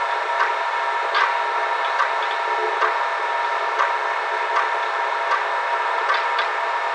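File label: tech ambience; dissension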